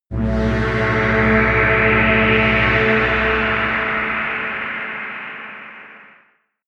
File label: air trumpet wind, drones